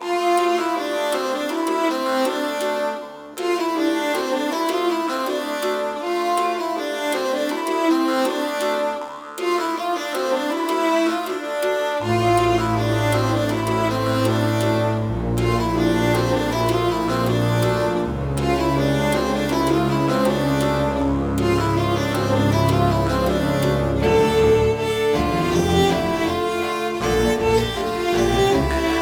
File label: General Combat Layer; Ard Skellig